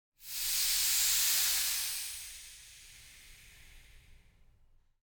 Sound Of The Bus Door Opening

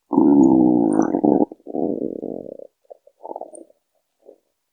Hungry Stomach Gurgling

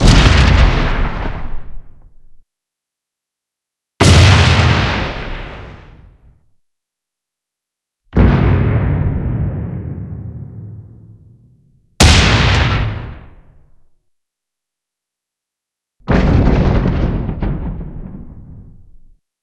Explode Boom Explosion Bomb

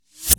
Transition

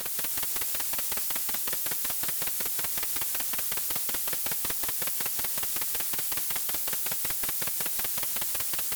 Sprinkler

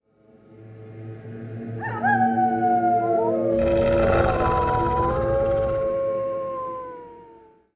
Forest Wolves; Doom

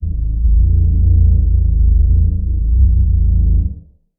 Deep Drone

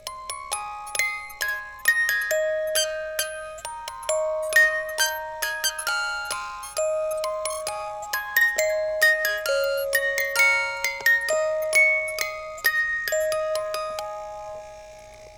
Musical Box Loop Fast

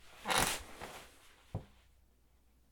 Office Chair Sitting

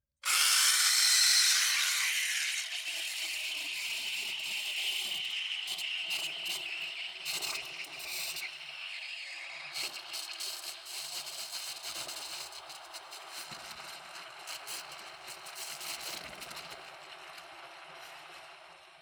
Milk Frother Mono

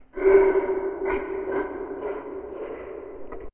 Creepy Laugh

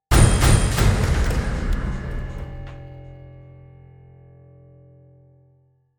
Drum End

